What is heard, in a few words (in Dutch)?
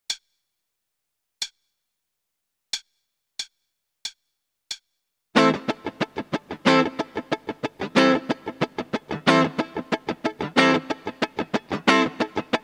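Ritme, gitaarstarter; Meespeel CD; Slagritme Schema 22; Slaggitaar 2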